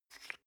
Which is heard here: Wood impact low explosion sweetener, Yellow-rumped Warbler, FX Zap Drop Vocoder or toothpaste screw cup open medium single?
toothpaste screw cup open medium single